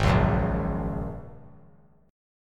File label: Ab 7th Suspended 2nd Suspended 4th, 3C,3F,3A,3D; Ab7sus2sus4 strummed